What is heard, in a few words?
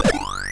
spawn; roflnauts